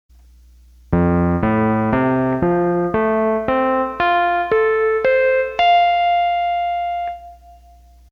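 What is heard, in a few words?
Electric Piano, Roland accordion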